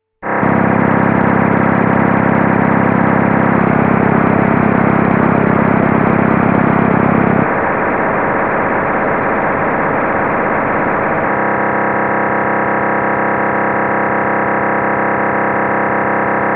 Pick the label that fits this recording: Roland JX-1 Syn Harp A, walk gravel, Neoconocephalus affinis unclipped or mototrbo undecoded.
mototrbo undecoded